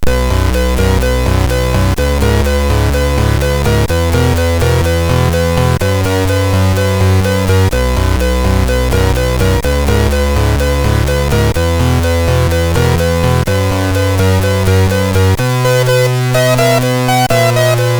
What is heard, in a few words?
Hip Hop, sid MOS6581R3; Mermaid; Early Tunes